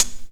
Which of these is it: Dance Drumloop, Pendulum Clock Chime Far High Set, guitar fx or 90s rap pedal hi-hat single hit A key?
90s rap pedal hi-hat single hit A key